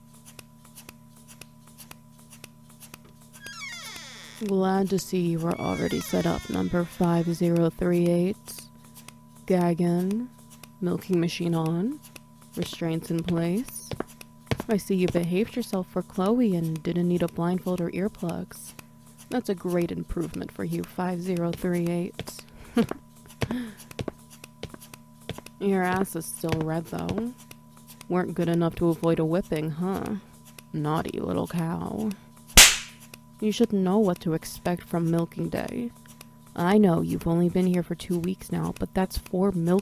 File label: Wet Noises Milking Machine